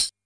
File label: tamb lofi; Western and Latin Percussion; single hits